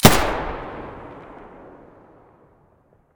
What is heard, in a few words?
muzzle ump45 stereo, weapons